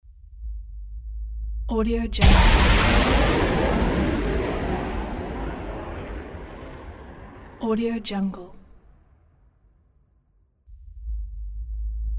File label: cinematic sonic boom